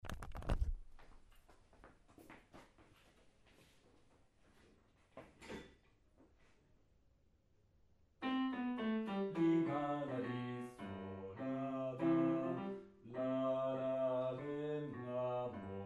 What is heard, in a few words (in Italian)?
bassi; el careter